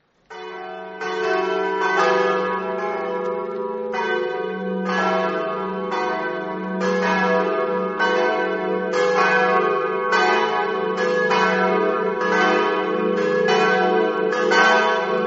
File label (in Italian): latisanotta, campane